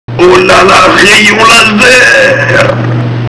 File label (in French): rayon laser